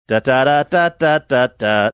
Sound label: singing audio button